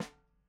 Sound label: Dyno Snare; Airwindows